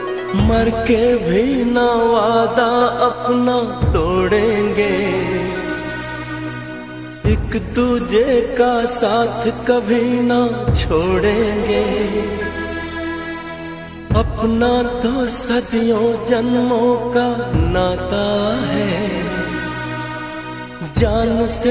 Sad Version Song

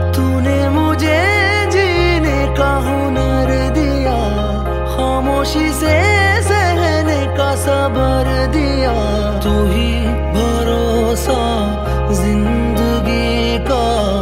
Super Romantic Ringtone

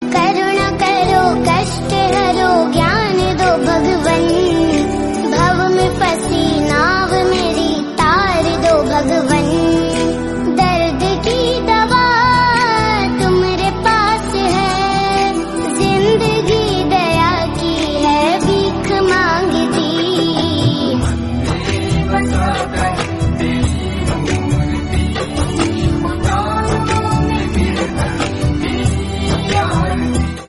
Hindi Bhajan Song Ringtone

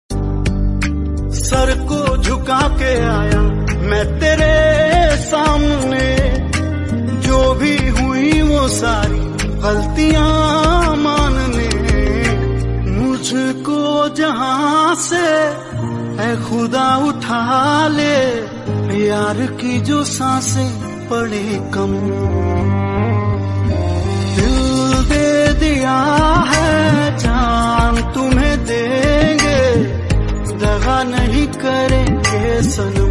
Bollywood Ringtone

